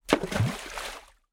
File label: impact stone hit water rockpool beach splash